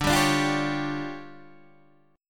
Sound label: D Minor Major 7th Double Flat 5th D Guitar DADGAD